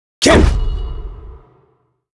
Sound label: Summoner M Attack